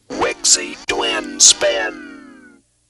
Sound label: Sono Vox